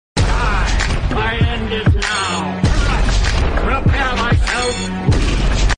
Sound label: rap; ultrakill